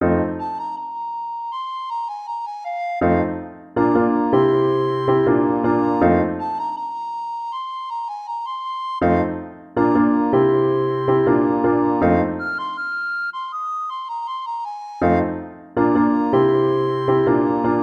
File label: happiness is the truth, recorder